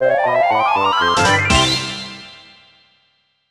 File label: Collect Jinjo; Banjo-Kazooie HD; N64 gamerip 1998